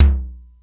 SDS9 LT, Simmons SDS-9; SDS9 Factory A1

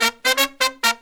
132, Pop & Funk Brass

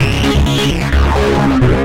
NRG Bass, Trance

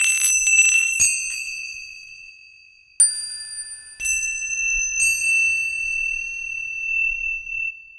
Indian Bell Hit Loop, Ethnic Instruments; Sonic Foundry (Sony Creative Software); The Electronic Music Manuscript Collection

